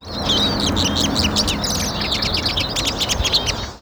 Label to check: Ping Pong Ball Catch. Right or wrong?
Wrong